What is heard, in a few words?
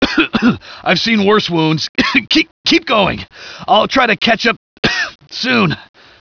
generic wounded